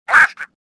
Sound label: anger, swamp; swamp2; Star Wars Jedi Knight II Jedi Outcast; XBOX